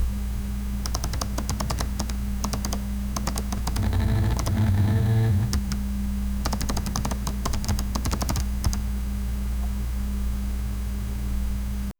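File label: starts with intense keyboard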